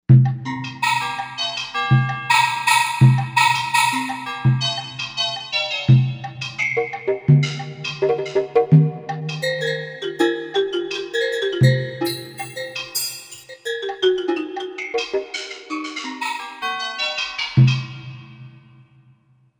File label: Tuned Drum, Korg M1Rex; Factory Patches